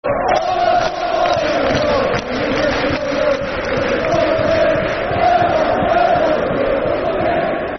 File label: Eieieio Bolton, Football Chants (Soccer Songs); Bolton Wanderers Bwfc